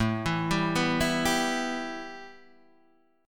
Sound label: A 7th Suspended 2nd A Guitar Open D, chord